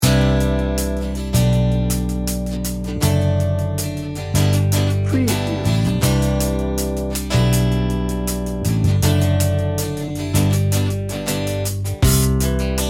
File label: laid back and warm no synth no lead